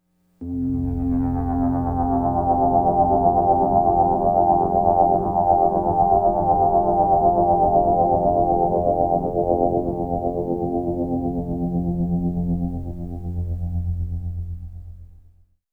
Low Complex Drone; Sonic Foundry (Sony Creative Software) - James Johnson Spektral Minimalism

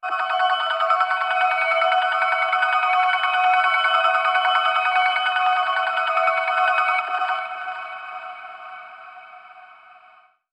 G MIN HIGH STUTTER 28BPM, pad

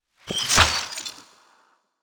glitch claps

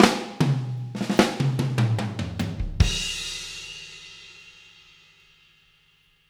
Fills 75; Zero-G; Monster Beats

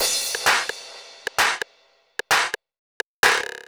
Hats, Drum Loops 130bpm; Zero-G; Soundclash Nu Dance Electro; Acidized Wavs; Straight Laced

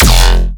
basic bass growl

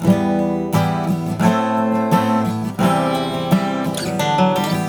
GTR, Celtic Flavours; USB Soundscan vol.42; AKAI

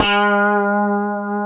A 3D, Guitars; Spectrasonics; Hans Zimmer Guitars VOL-1; 90's Sample CDs; COMP POP+VIB

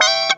WAH SLIDE UP; PlatinumPhattCD1; E-MU Producer Series Vol. 8 – Platinum Phatt; Mo Phatt Instrmts

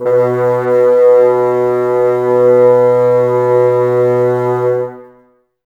Bassoons B 2; Miroslav Vitous - Woodwind Brass Ensemble

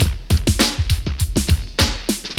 Loop, Roland; Sound & Vision - Gigapack I

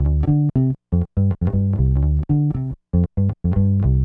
Bass, 70s Analog Funk

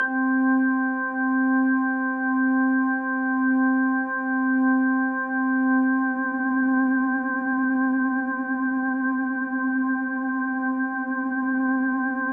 C5, Synth Line

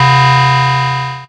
VCS BELL; ANALOG BELLS; Ambient; Zero-G; Ambient 1

